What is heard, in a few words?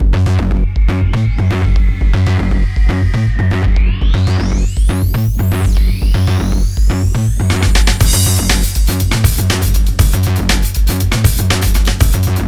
Battle loop